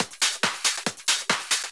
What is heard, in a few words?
Loop 139BPM, Drums & Perc; G#minor